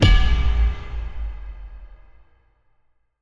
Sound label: Epic Metal Hit; soundscrate